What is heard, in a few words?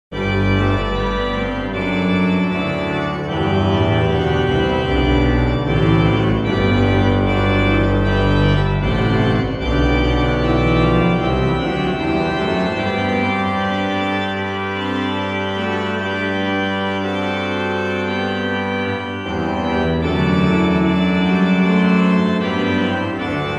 Noel, Organ Music, Souvigny; Boely